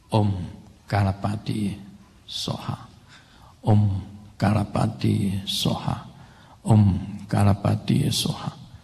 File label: Red Jambhala Mantra